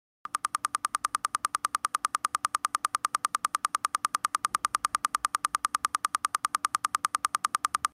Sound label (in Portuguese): Helicóptero